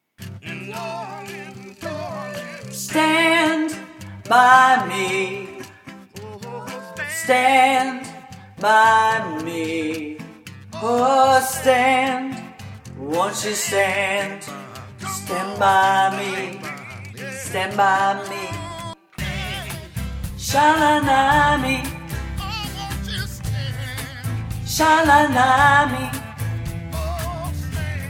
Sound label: harmonies